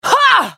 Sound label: spell cast effort, en US; Caitlyn; Character Voices - English